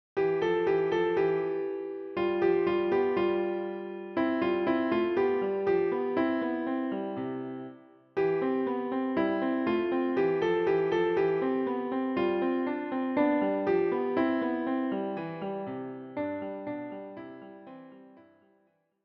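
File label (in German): Klavier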